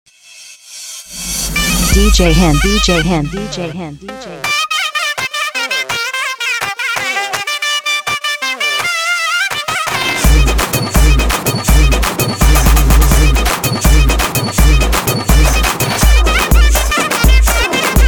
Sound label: Tamil 2016 Remix Songs